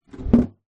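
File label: industrial crate plastic set down concrete ground; industrial create plastic set down concrete ground; zapsplat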